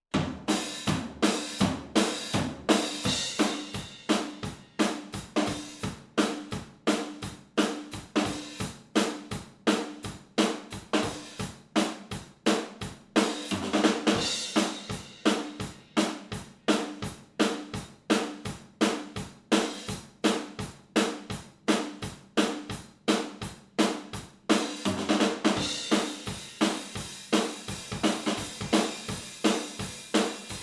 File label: Drums Blumlein